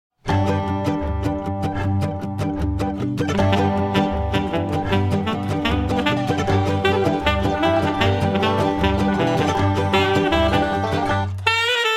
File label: Bluegrassy sax